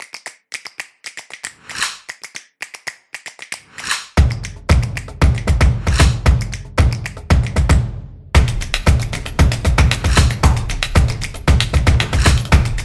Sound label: This Is Drums